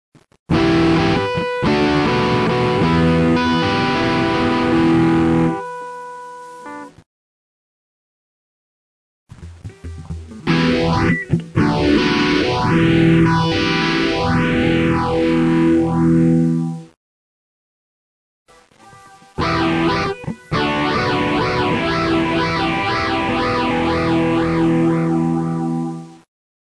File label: Phaser OD